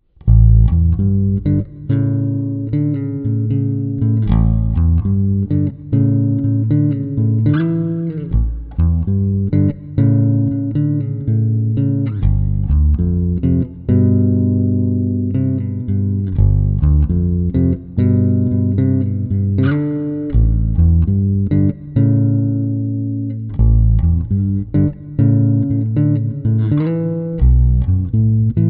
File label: bass